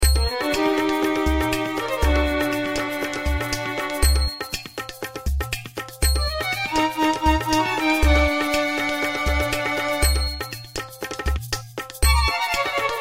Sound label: Tribal Composition, Tribal Composition Tabla Udu Shaker Violin Eastern; Musical; Blastwave